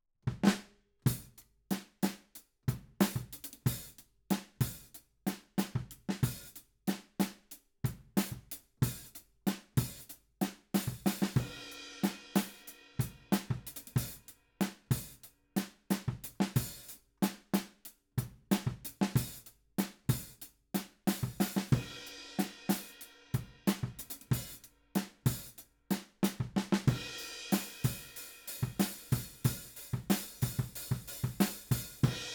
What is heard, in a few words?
Ride